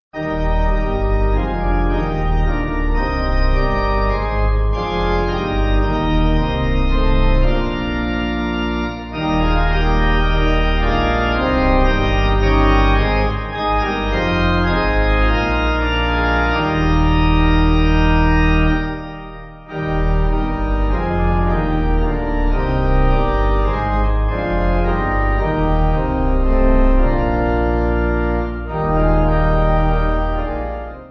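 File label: Pipe Organ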